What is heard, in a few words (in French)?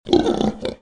Cochon, Animaux